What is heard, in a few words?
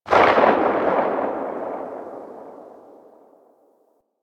Sniper Rifle Tail; Weapons; Female Sniper Rifle; Grineer